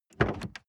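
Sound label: sports car exterior door open soft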